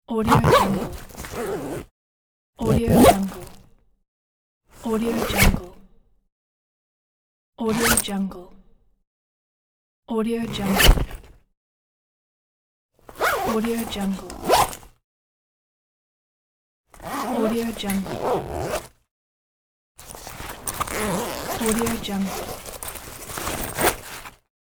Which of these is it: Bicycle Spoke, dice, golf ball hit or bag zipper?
bag zipper